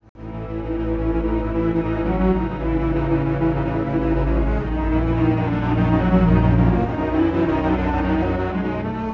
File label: strings; pitchshift; i3 dsp quattro x